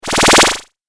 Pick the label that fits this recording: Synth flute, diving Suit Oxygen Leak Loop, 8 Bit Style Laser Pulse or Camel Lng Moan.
8 Bit Style Laser Pulse